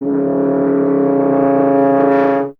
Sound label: BRS TUBA, Solo Instruments